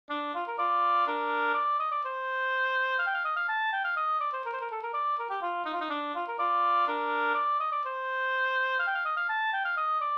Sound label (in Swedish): polska Lillpolska